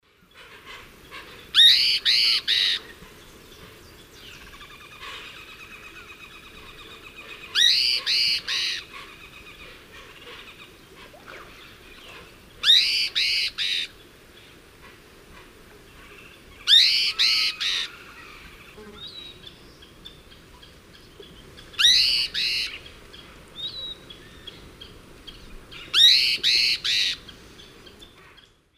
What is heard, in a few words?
Philohydor lictor, call; S. America; Eastern Lowlands